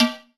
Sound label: whiff; HeavenStudio; night Walk Agb